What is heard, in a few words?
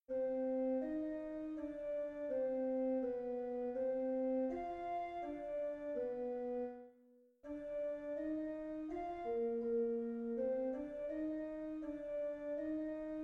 tenor alone; bach 93 sing bet und geh; choir; sing bet und geh auf gottes wegen bwv 93